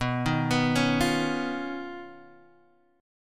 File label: D sharp 7th Suspended 2nd Sharp 5th